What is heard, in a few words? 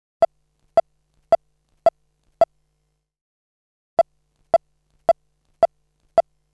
Button; string quartet